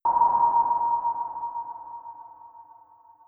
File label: submarine; Cyberpunk BreachHaxx0r; warframe; scanner